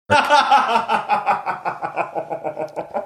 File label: having good laugh